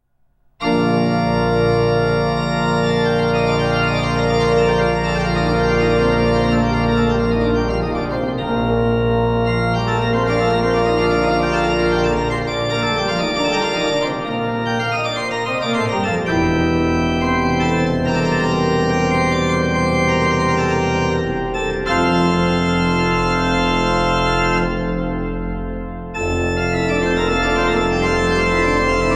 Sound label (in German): Toccata; Toccata XII